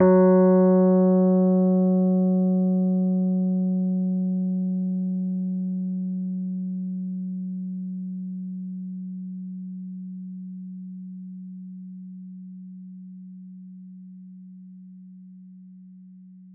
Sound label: F#3 MID, Rhodes Mark II; CEPCo Sample Series Vol. 3